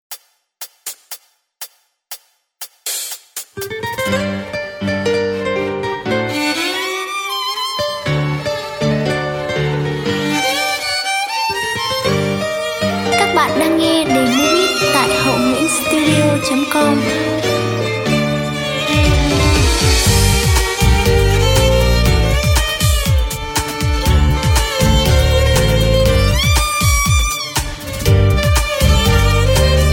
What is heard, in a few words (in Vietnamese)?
beat